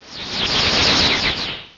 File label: Psystrike; pokerogue; battle anims